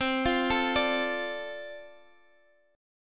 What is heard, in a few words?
F 6th C, chord